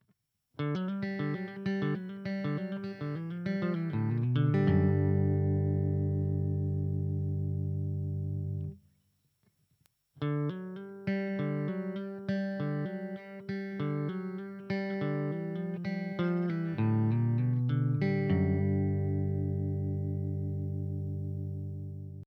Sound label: Chicken Pickin Lick, guitar